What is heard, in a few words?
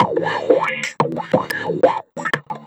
Orbish Funk, Synchro Funk, Beats